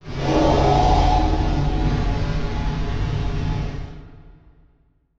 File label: Transition Whoosh Fire; Production Element; Title Transition; AdobeCC